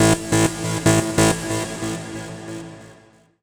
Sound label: TECHNO EXTRA 140